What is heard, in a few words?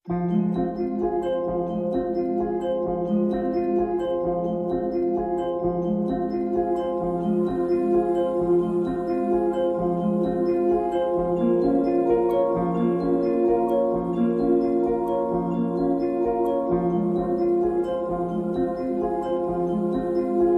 Handbells F